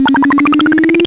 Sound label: BURBLE